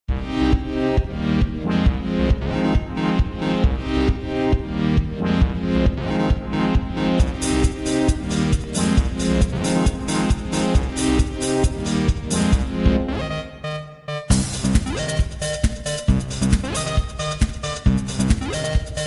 Level Track; block breaker 3

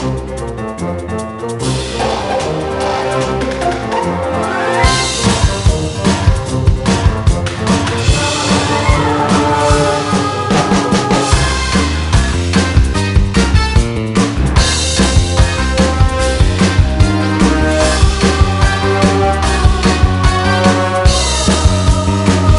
Music Boss; Megamind: The Blue Defender; NDS

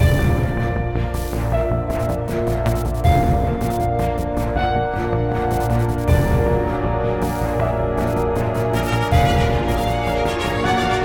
Battle Loop; Infinity Blade 3

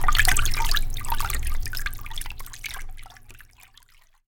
Fluid; Puddle Plus

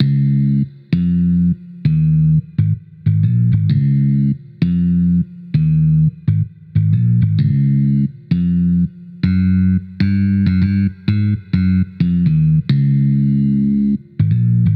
Swamp Bass; BouncyBoi in Puzzle Land

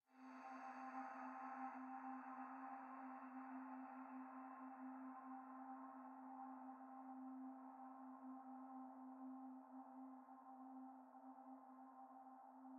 Desert Music, Stepping Stones Decays C3

